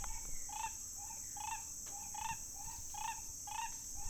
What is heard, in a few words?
Ingerophrynus quadriporcatus